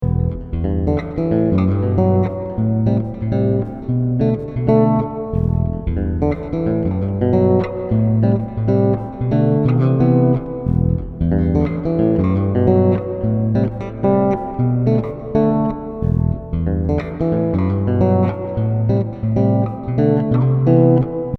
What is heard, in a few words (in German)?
Hall